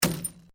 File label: Glass movement minor; Material components for constructing more complex sounds; Glass (Sound Effects:Materials)